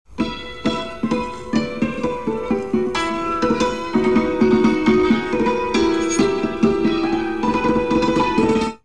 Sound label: cymbaly; ukr kino; bilyj ptah